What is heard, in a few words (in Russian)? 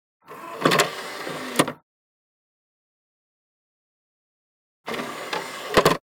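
computer CD drive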